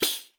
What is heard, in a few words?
RIQ DROP, HAND DRUMS; SoundBytes - Early Patches